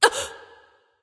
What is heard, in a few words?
surprise; smite; arachne